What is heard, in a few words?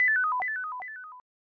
Item Chime; Secret Area